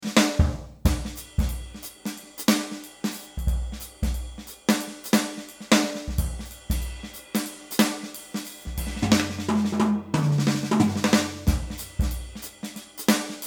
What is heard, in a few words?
jazz